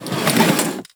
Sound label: Classroom Chair Drag; Ambience; Warframe; TheNewWar; Zariman